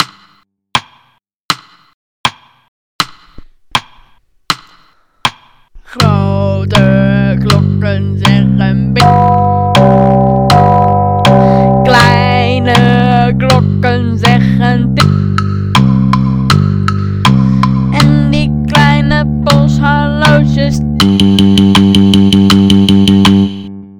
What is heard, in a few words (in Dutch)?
Grote klokken zeggen BIM BAM BIM BAM